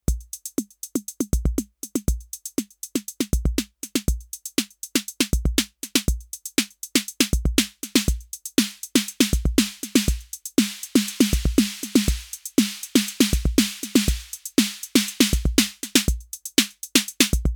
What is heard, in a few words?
Roland 7X7 TR8 606 Snare